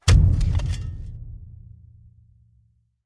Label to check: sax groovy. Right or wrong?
Wrong